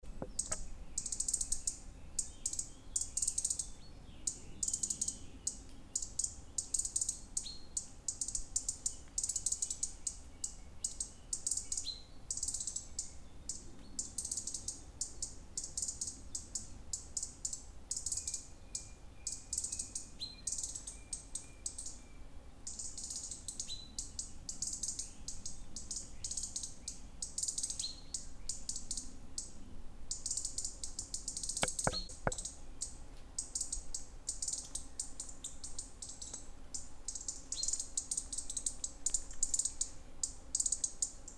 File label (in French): rouge gorge